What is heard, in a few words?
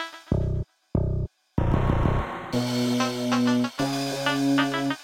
Hip Hop Beat